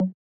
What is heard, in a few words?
Snare